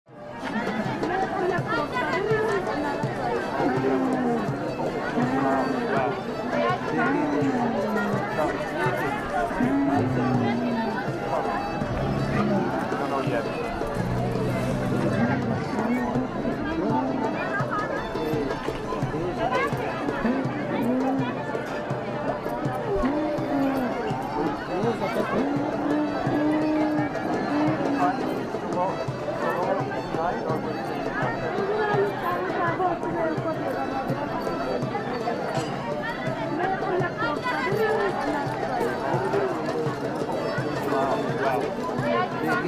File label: Ambience Bazaar Oriental Market, Trade, Camels, Spices, Music; D&D